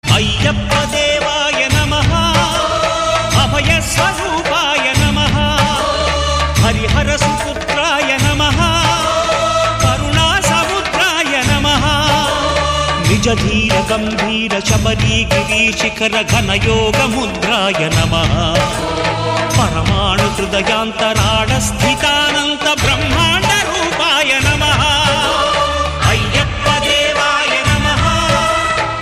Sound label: wonderful ringer